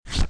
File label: open